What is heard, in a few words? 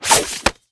Equip, karambit; cstrike; valorant; rgx karambit